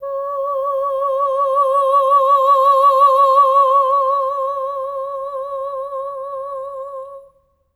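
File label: Soprano Oh C#5, Voices; Spectrasonics; Symphony of Voices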